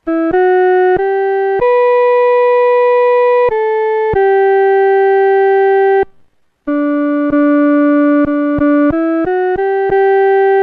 独奏 第一声

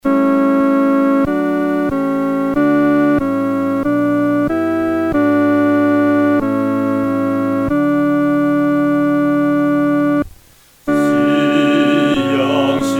独唱（第二声）

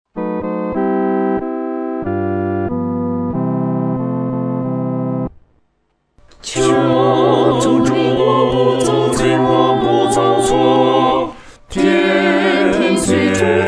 合唱 四声部

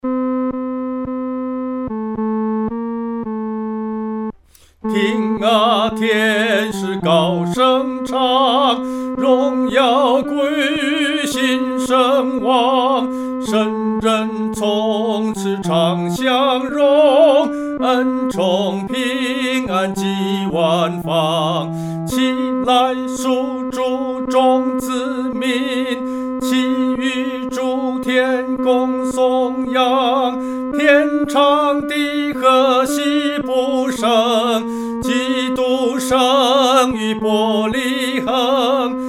独唱 第三声; 听啊，天使高声唱

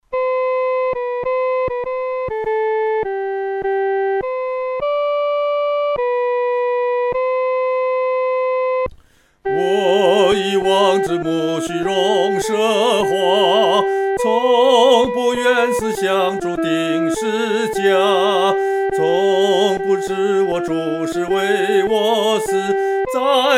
独唱 第一声; 在各各他

